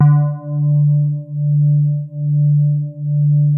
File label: SOFT D2, SYNTH PLUCK; Music Store - Claudius Diemeter Schwerlos